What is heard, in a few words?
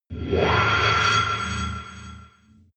alien metallic scream ring reverb; aliens; soundpool